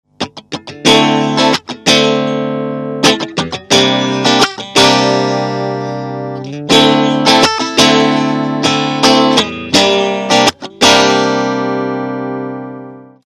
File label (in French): strat chevalet intermediaire; vol6; fender champion600